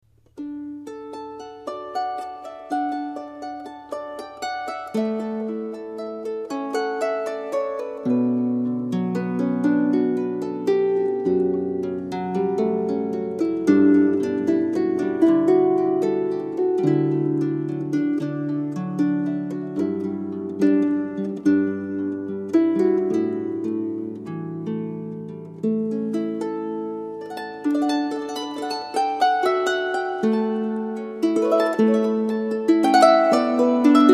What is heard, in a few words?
Folk; Pachelbels Canon